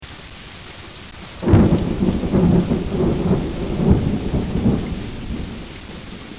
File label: thunder